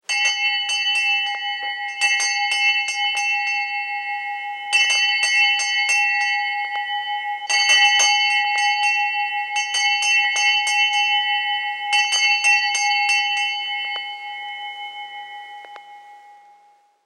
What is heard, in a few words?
Vintage School Hand Bell; Musical